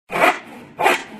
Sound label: saw 2 times